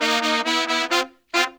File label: Phantom Horns, 132BPM; 90 s Sample CDs; Zero-G